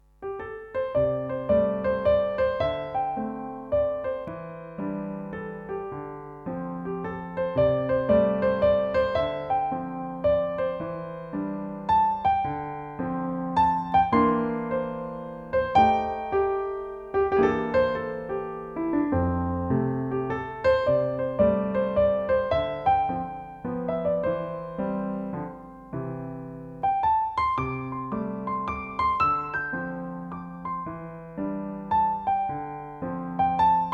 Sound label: Jazz Waltz